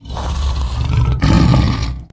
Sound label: giant breath; russian; sven; russian sounds